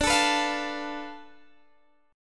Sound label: D Minor 6th Guitar Open D